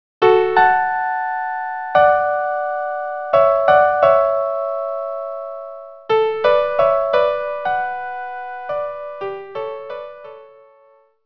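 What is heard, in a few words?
violin viola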